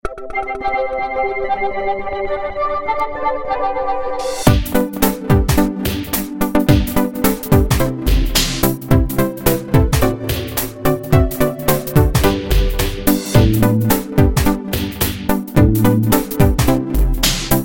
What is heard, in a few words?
dj jingle